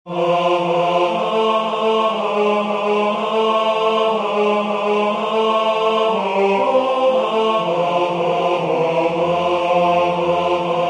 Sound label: Rocking Carol tenor; Christmas; Waiheke Island Choral Society